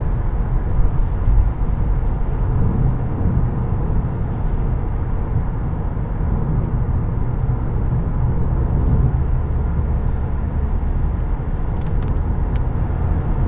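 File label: Environment interior car motorway rain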